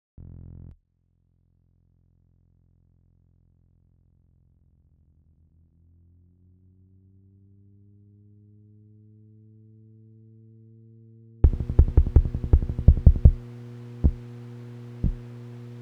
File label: spinup idle park, clatterdrive